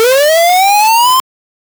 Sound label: the sound effect of charg, jump